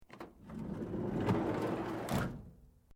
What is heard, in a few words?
Car van old door sliding close fast